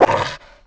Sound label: cer pain; svencoop; perro